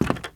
squeaky wood walk, step, bamboo

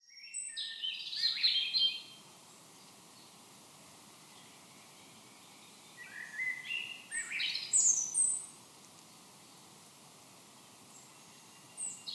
bird wind, ambient

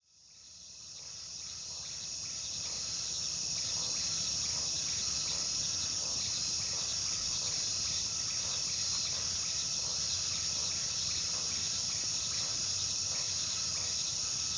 cricket jungle; ambient

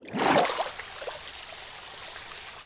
exit, underwater

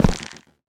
step, stem; block; Minecraft

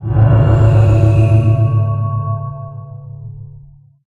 power, beacon

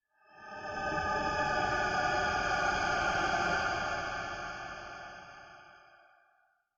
cave, ambient; minecraft

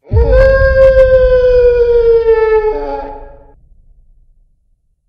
werewolf; suspenseful sighting

